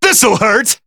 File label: Throw; BBTAG